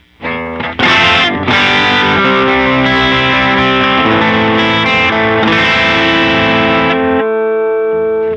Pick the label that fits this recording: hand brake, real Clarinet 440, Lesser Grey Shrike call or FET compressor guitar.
FET compressor guitar